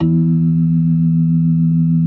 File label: B3 E 3; Best Service; Advanced Media Trax Modern Composer; B3 LES 1